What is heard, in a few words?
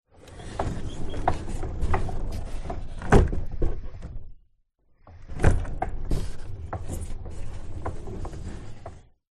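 Barn door Open Close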